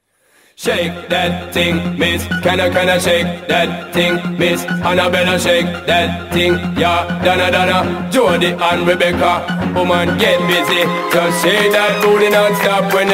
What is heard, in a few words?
MUSIC SOLO DANCEHALL